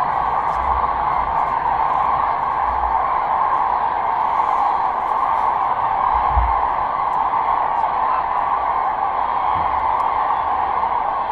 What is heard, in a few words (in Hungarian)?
hajnal látogatók, daru vonulás; hortobagyi nemzeti park 2013